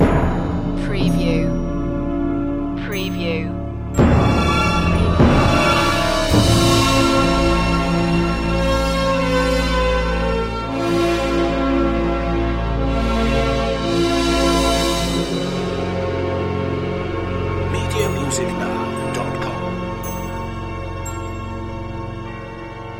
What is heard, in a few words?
orchestral suspense